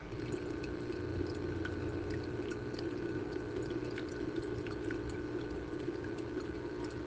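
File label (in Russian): фонтан для кошек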